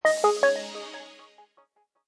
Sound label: Power Off, phonetones system; LG; T370 Cookie Smart